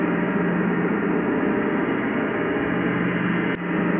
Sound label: sparse soundscape samples; ReLooped